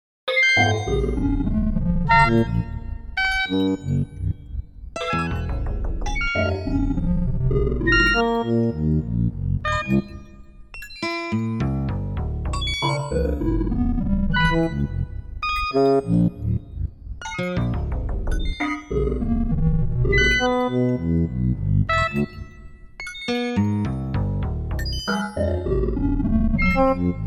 harmonics and sub harmonics with fibonacci tonescape